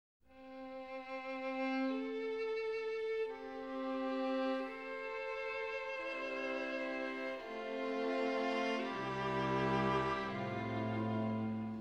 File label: String Quartet in A Minor, Molto adagio; String Quartet No. 15; Op. 132; Ludwig van Beethoven; Beethoven - String Quartets Nos. 1-16